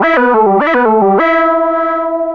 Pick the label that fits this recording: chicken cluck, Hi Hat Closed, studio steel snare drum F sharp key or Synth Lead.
Synth Lead